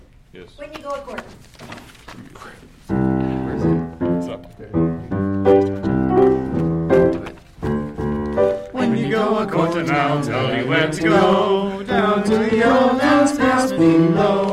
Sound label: bass